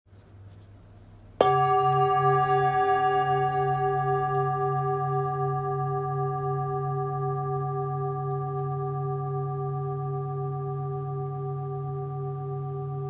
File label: bowl, singing bowl; 24cm; Polished Harmony Bowls